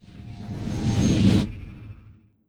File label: Armor Strike Magic; Legend of Keepers (gamerip) (2019)